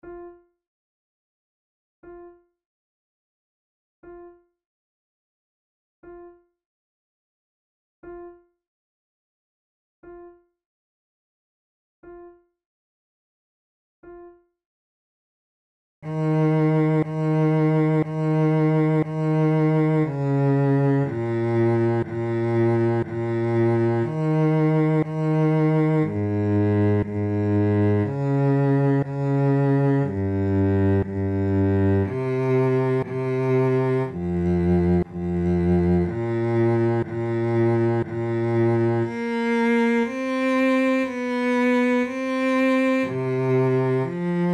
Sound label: adagio, flute